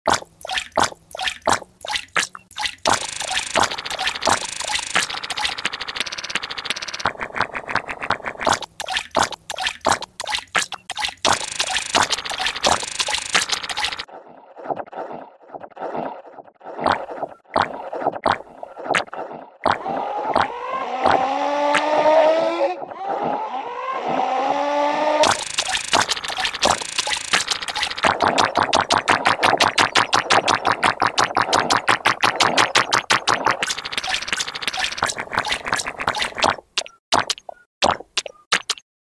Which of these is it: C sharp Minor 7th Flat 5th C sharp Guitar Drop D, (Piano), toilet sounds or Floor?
toilet sounds